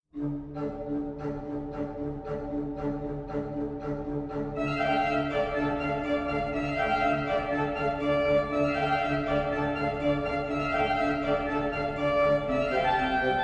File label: Kettle drums